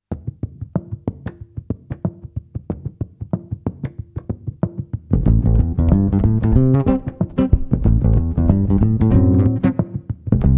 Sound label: Acoustic